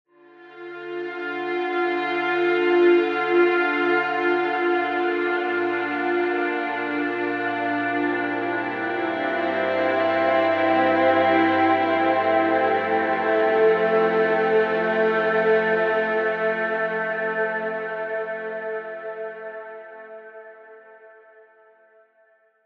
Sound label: Analog Strings; Output; Ambivalence